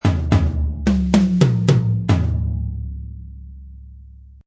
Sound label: Tom Fill, Tom Fill Loop1 at 110bpm.; Drum Loops